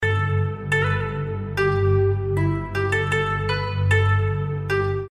peaceful japanese music; call tone

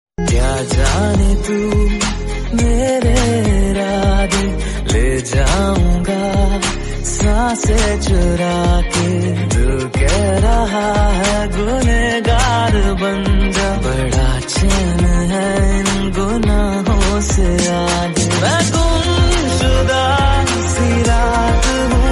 romantic bollywood

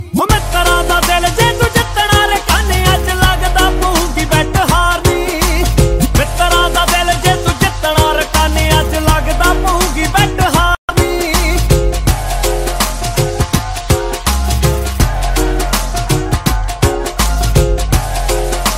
punjabi ringtone

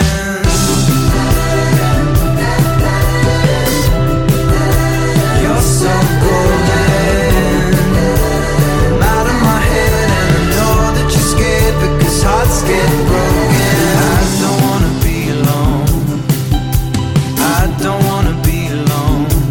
english music ringtone

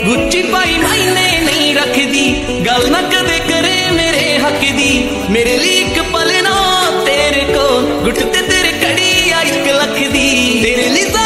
punjabi ringtone

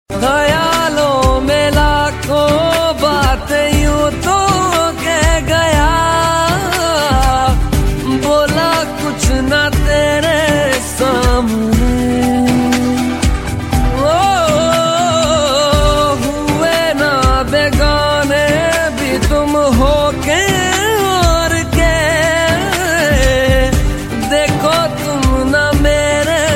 sad ringtone

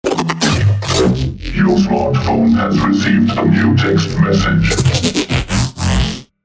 transformer electronica robotic music; sms tone

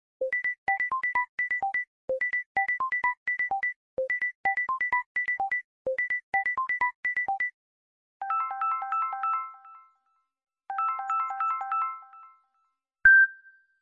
latest beep beep musical tone

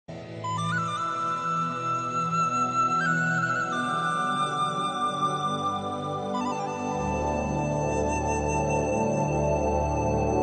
flute ringtone; escaflowne

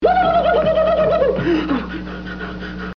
curly sound

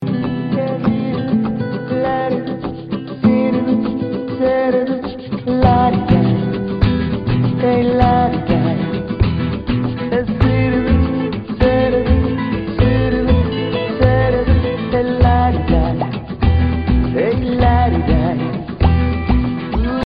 samsung ringtone

